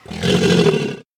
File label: alligator angry, utterance